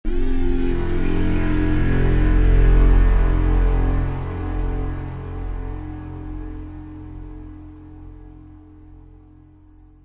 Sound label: sound design cinematic hit soft long buzzing groaning dark sinister horror